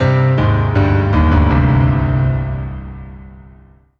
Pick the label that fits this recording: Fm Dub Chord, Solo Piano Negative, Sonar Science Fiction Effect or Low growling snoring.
Solo Piano Negative